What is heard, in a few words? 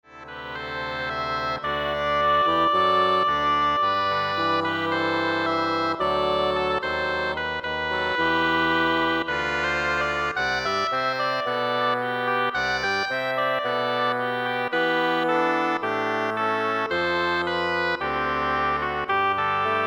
Double Reed Ensemble